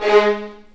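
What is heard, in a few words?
G#3, The Ultimate String Collection, violins marcato; East West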